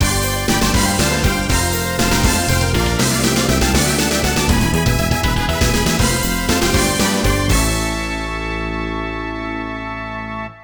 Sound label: Fanfare; Goal FH; 3DO